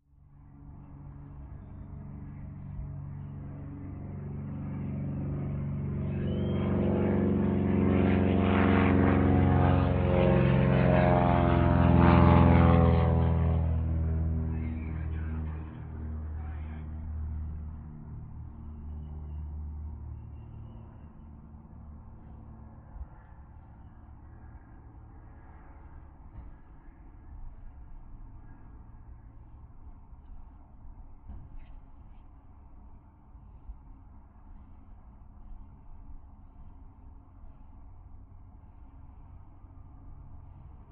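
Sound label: Prop Single Fly Bys Over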